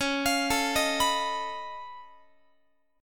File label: C sharp Augmented 9th, C sharp, down, Guitar; arpeggiated; 4A,4E,5A,5D,5G#,6C#; C#+9; JGuitar